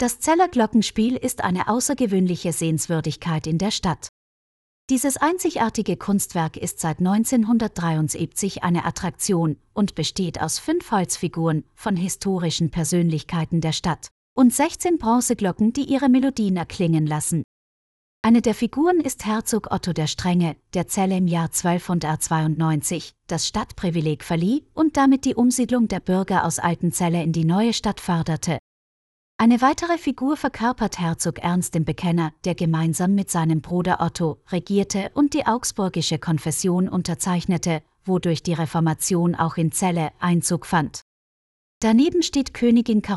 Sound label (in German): Celler Glockenspiel